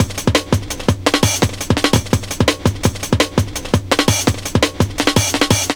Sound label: LOOP, Explosive Jungle